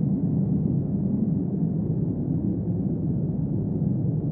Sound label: ambient planet class zoom; Stellaris